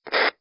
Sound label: remote end, radio sounds